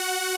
lead

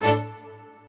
strings

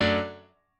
piano

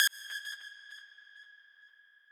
lead

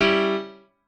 piano

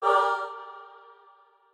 chor

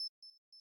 arp

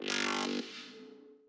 wobble

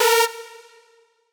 synth

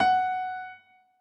piano o6fp

